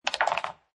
Billiard Pool Snooker Ball Falls In Pocket; Pool ball, snooker ball, billiards ball; Sports; LynneMusic

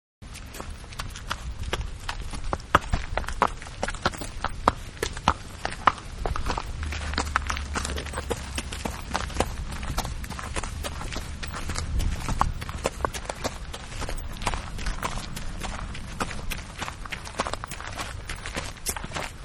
Horses Slow trotting; Horses (Sound Effects:Animals); Manhattan Production Music

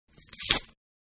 Paper Card Torn Short; Paper Card-Being Torn-Short; Materials; Manhattan Production Music

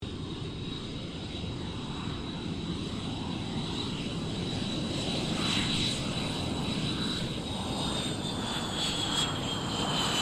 FA-18 Hornet taxi, stereo; RAAF; Airplanes; Sound Effects:Vehicles

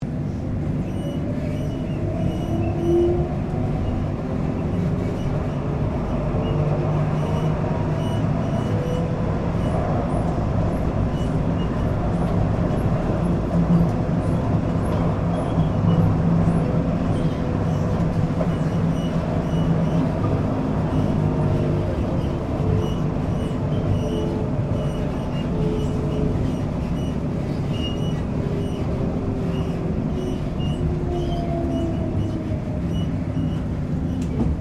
Tram Melbourne C Class Interior Empty Very Long; Streetcar; Various Melbourne trams; Streetcars

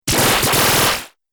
Laser Fast Shots, Laser Gun

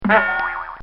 Cartoon Sound Effects Bong; Cartoon Sound Design

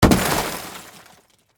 Impact Automobile Window, Shatter; Crash Sound Effects; Crashes; Objects

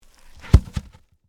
Impact Wood Panel, Drop, In, Snow; Materials

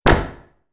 Multimedia Button; Button Sound Effects

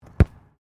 SOCCER BALL KICK FROM GROUND; Soccer ball sounds; Sports

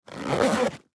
Duffle Bag Empty Zipper Zip Up; Duffle Bag Empty Zipper Zip Up Short; Zipper Sound Effects; Objects; Foley

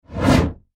Production Element Whoosh Reverse Fast; Whooshes; Sound Design

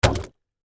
Jug Drop Full, Foley, Jug, Drop, Full, Water; Drops; Objects; Blast Wave

